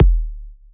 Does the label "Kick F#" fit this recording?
Yes